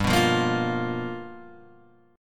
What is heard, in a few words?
G 7th Sharp 9th G chord, Guitar Open G